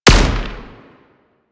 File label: Anti Aircraft Gun Shot C; Weapons; Warframe; Archwing